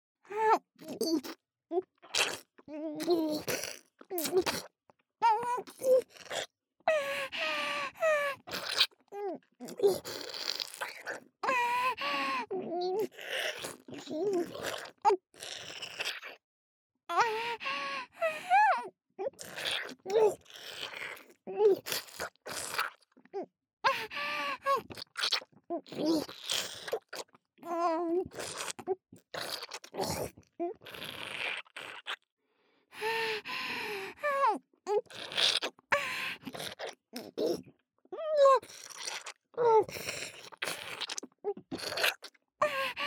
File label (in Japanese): ディープスロート【ループ】; ループトラック